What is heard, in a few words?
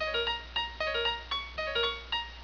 enter; lavalovelounge